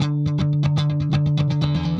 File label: Clean Guitar Pulse; Guitars